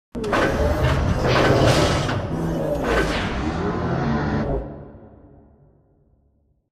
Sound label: Robot Arm Pass Receive Close; Boomtown; Titanfall 2